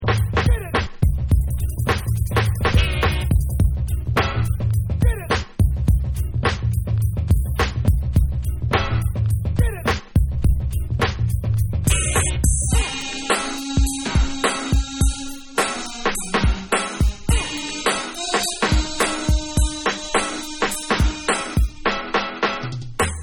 Instrumental Version

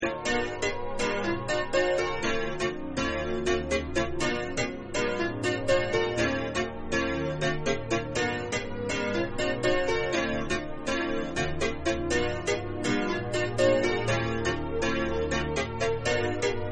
Pianoappella

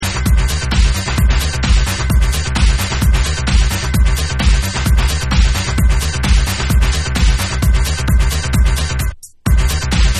Instrumental Mix

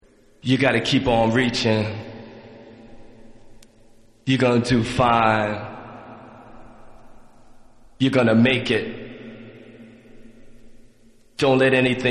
Acappella

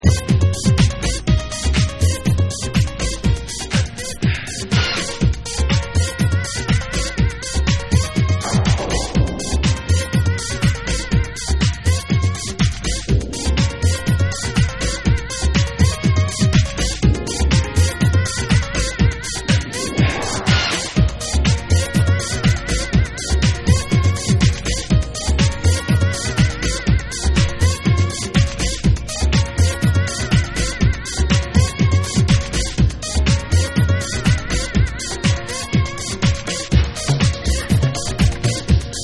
Salsa House Remix

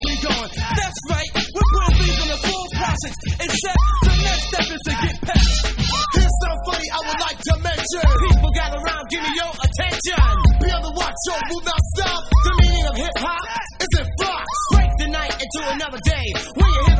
Vocal

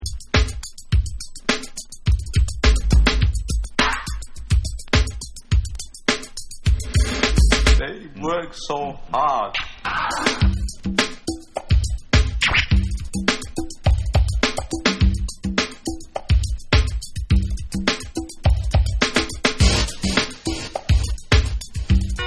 BONUS BEATS

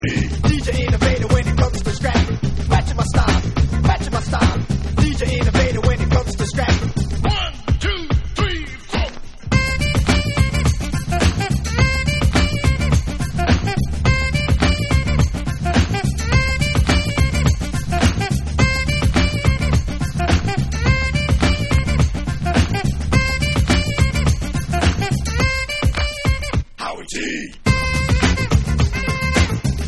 Sax Mix

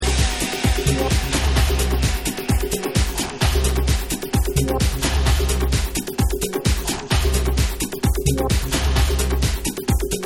Dub

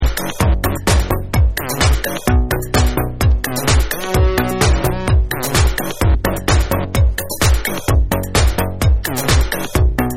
Instrumental

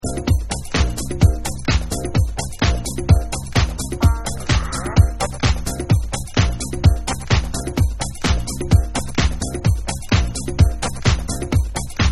BASS

